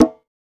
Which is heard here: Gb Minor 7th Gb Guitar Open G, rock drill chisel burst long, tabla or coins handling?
tabla